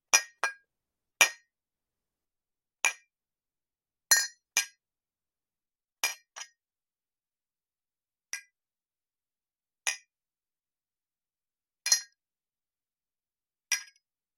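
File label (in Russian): звуки чоканья на празднике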